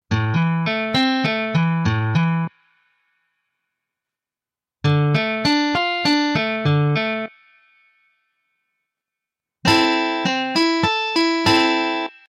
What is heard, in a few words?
Arpeggios; solo techniken